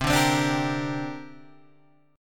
C 7th Suspended 2nd C down Guitar Open G

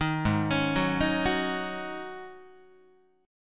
G sharp 7th Suspended 4th G sharp, Guitar; G#7sus4; 6,4,4,4,7,5; arpeggiated

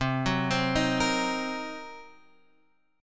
F sharp 6th Flat 5th C

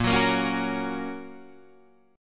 A sharp Minor Sharp 5th, Guitar Standard; strummed; JGuitar

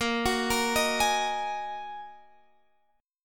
A sharp 7th Suspended 4th Sharp 5th A sharp; arpeggiated; chordshape; 18,21,20,20,21,x

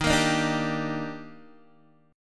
D sharp Major 7th, Guitar DADGAD

